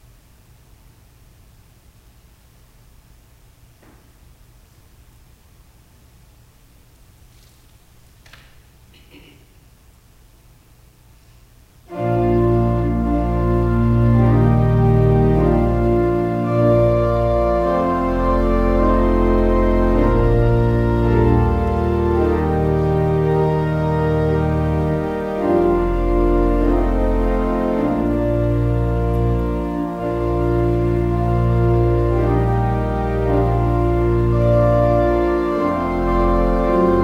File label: Processional; True Blessedness